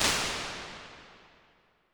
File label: Medium Tail; Large Spaces; Rooms; Impulse Responses; Apple